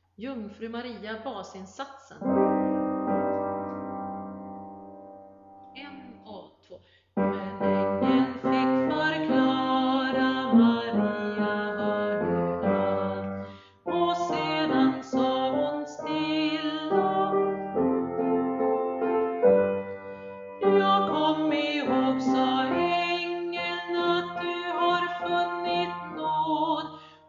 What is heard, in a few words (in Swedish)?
bas; Jungfru Maria